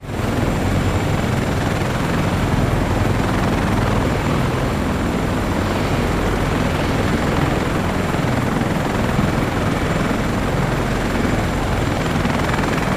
CH-53 Super Stallion helicopter idles on tarmac. Choppers, Aircraft Engine, Helicopter